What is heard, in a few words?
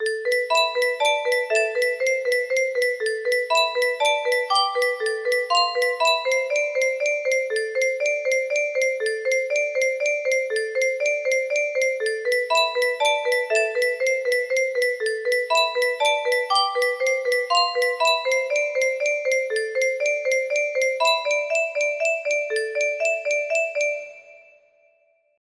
simple, perfect fifths replacing octaves, music box melody; gwyn lord of cinder